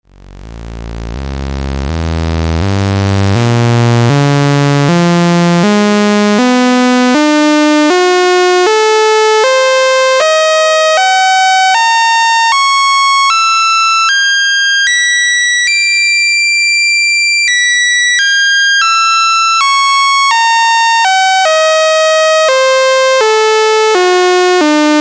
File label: quant, modular